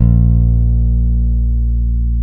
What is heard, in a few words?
A#1, BASS; AKAI S-Series CD-ROM Sound Library VOL-8